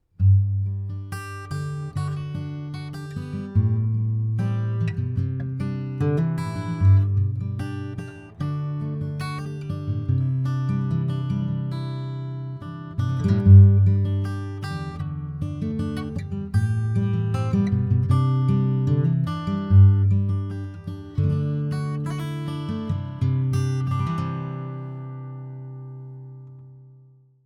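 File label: guitar soft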